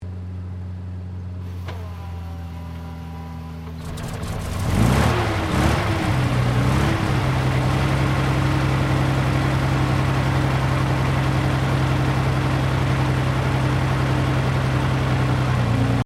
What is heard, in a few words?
TANK CENTURION PRIMARY ENGINE IGNITION, British Centurian main battle tank; Vehicles